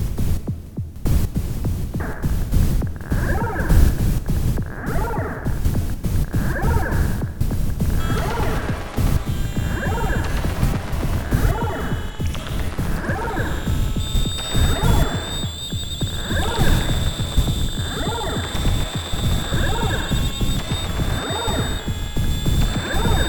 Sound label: experimental